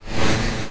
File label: Inside your Computer program error, Windows 98